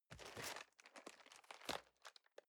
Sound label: cardboard box medium turn soft